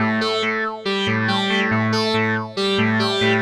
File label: TECHNO SYNTH 140; Keys